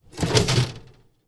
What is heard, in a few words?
gerbilarium lid slide open; sound effects one